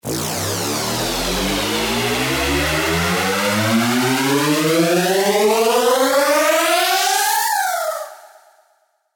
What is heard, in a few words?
Uplifter; SERUM FX